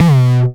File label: 909 Grime B2, Kicks; Drums; MBase 11 - 909 Grime